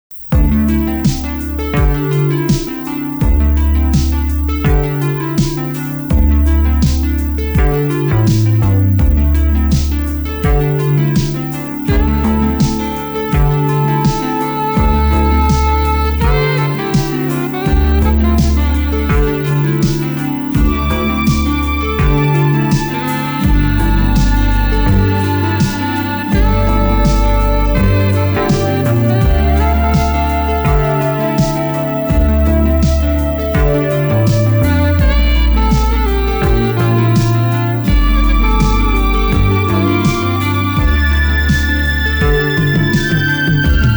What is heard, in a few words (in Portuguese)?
Trilha Sax Theme; IpanemaFM; Spots Agencia Jinga